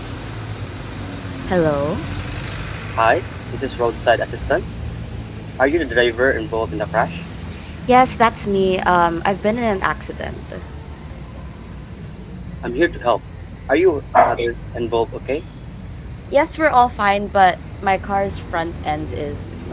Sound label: Crash Roadside noisy